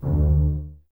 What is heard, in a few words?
BSS D 2 Pizz, String Ensembles Basses; Miroslav Vitous